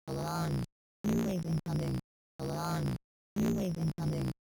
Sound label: scc alarm no normalized phase; msx